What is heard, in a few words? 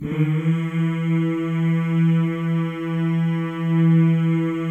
male; Spectrasonics; Symphony of Voices